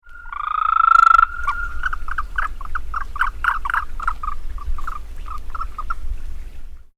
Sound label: bufo americanus